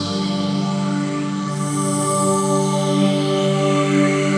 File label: Pad, 6A; Jungle; Blu Mar Ten; Jungle - 1989 to 1999